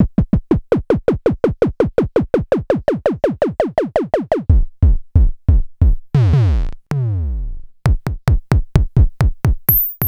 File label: Dual Percussion Synthesiser KICK; Klone; KLONE KICK NW8